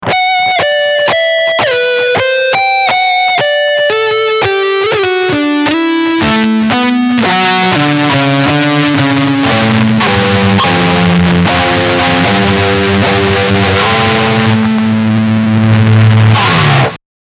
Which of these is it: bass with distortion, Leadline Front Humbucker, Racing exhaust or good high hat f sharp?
Leadline Front Humbucker